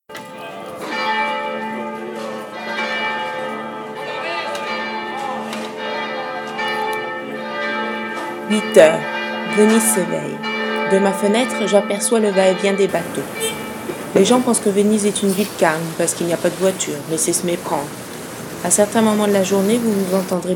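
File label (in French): matinée vénitienne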